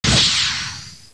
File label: laser; Babylon 5 - Entertainment Utility